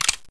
357 out, weapons

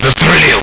shot

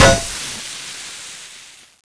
gascan, bullet impact

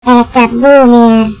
alert

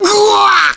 pain; frieza

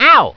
barnacle crunch; supermariobros; luigi

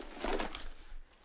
crossbow admire, weapons

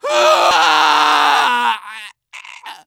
medic pain crticial death; tf2

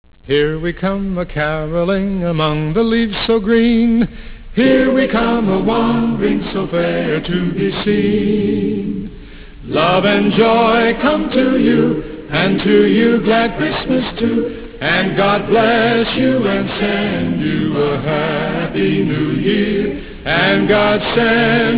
radio xmas music

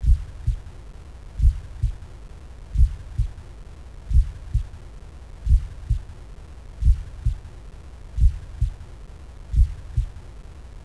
heartbeat sick

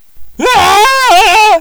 scream

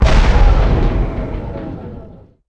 gauss overcharged, weapons

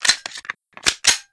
reload

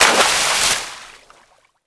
water jump

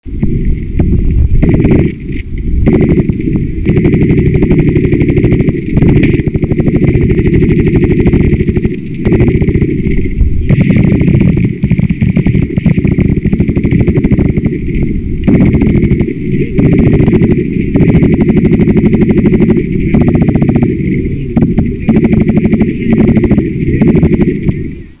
battle raging

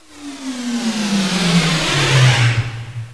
incoming, meteor

